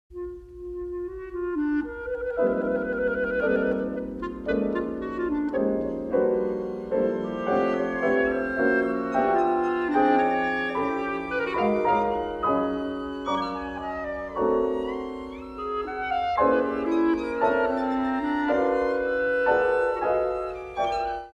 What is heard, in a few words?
birdsong